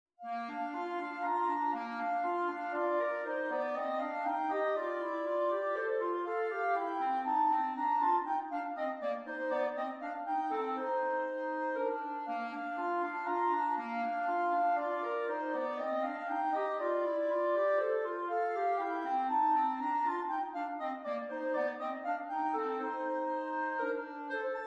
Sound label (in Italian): duetti per clarinetti